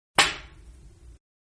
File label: Billiards Stick hitting cue ball Only; Pool; Sports